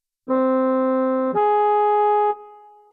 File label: organ interval